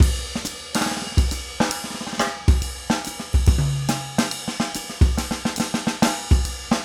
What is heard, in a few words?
trip jazz 140